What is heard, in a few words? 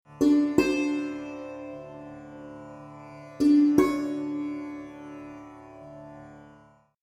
Swaras 7 Ni Drone; ragas; ragajunglism